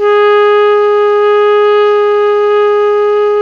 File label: TENOR PP G#4, Saxophones; Greytsounds; Philip Wolfe David Crozier's Saxophones